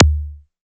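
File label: kick, ARP Odyssey Drums; Polyend; Simulacrum Sounds; 1 Shots; Synths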